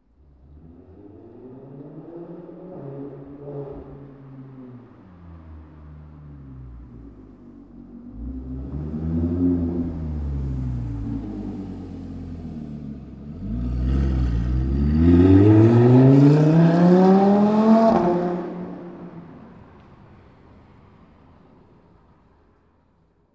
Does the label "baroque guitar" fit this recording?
No